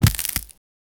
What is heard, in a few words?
cards